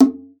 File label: FINGER02, bongos conga; Best Service ProSamples vol.42 - Session Instruments